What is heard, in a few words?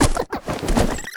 THORNASH WAR DAMAGE